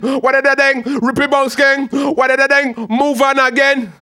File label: HAL RAGGAM, RAGGA-MUFF C maj; Sound & Vision - Gigapack II